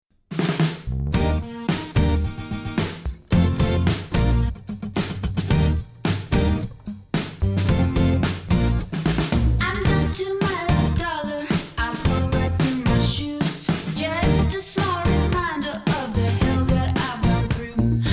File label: Female Vocal